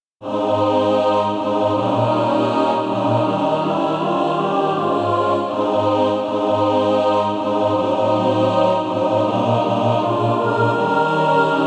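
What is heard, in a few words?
mixed, SATB Practise Music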